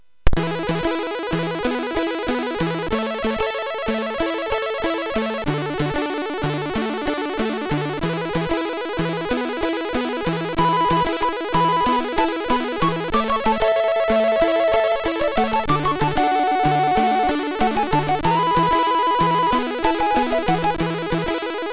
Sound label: Victory, MOS6581R3